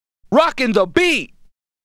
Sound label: fx and scratches